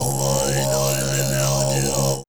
MONK L-R, ETHNICSTUFF

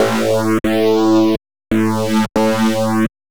ELECTRO SYNTH 140 A

